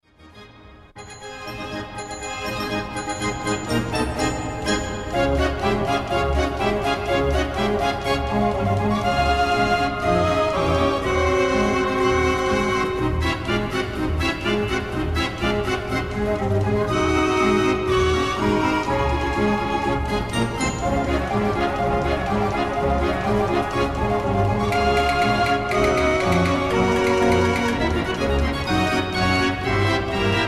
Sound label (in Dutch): draaiorgel; Origineel Amsterdams draaiorgelfestival